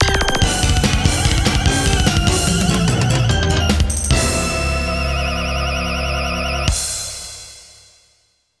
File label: Game Start (GS)